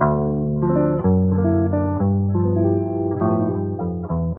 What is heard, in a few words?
guitar relax, Loops, Phonky